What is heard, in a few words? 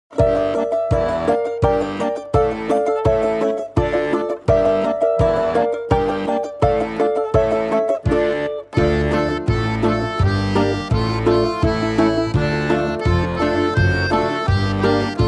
Old Russian Folk Dance